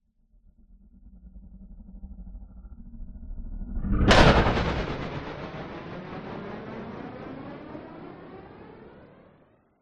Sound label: sound design sweep stutter growl into metallic hit and flanged tail; zapsplat